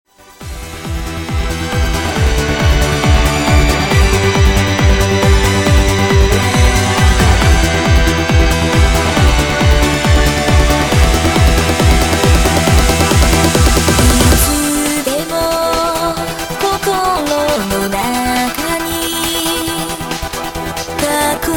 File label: Pops